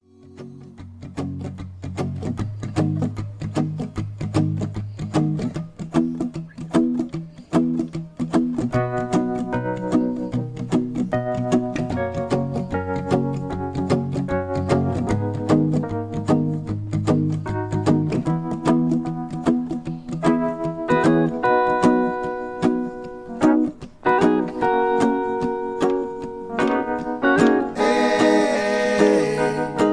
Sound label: INSTRUMENTAL